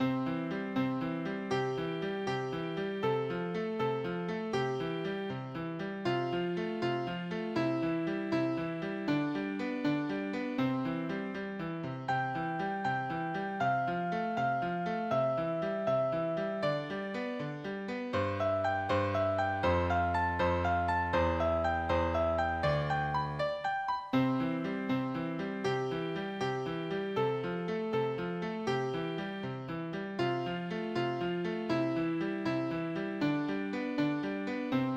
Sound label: Twinkle piano